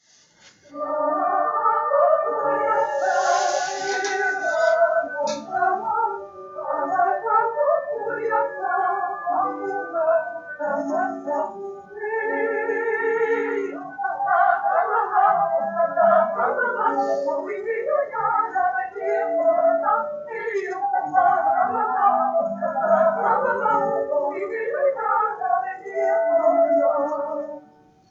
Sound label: folk